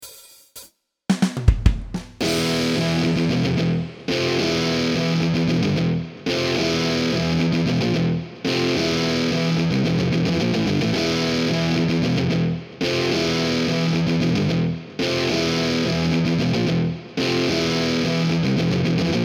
Rock (Only Guitar)

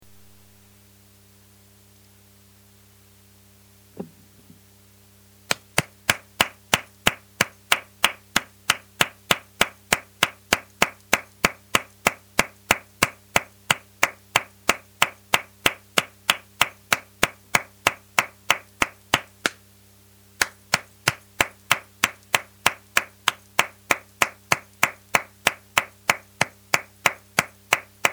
Rhythmic clapping

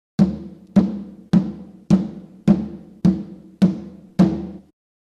Timbales

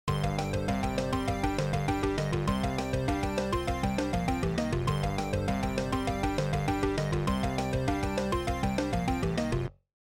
videogame

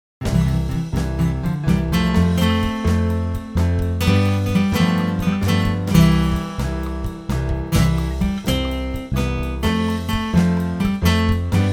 calypso

